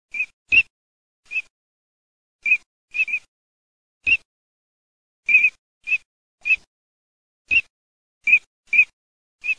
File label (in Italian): alzavole maschio, birdsound